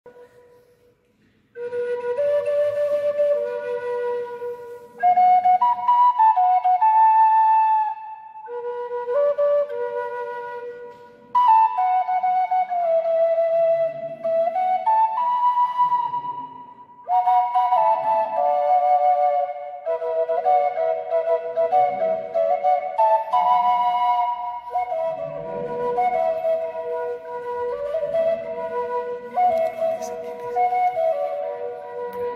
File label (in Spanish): flauta doble escultorica; en el Baricharte casa de Cantabria en madrid Espana